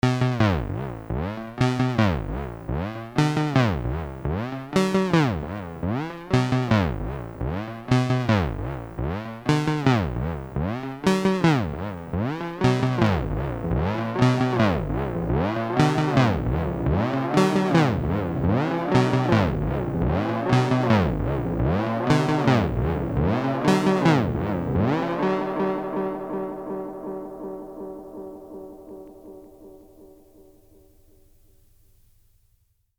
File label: T REX Replicator Module Moog FX Time Modulation